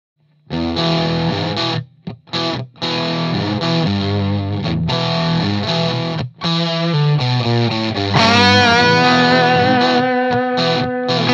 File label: tube fuzz, mercury fuzz sounds; helios